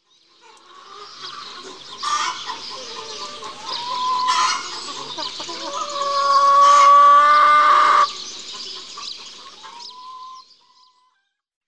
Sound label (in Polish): ptaki hodowlane, glosy; pieciolatki; karty aktywnosci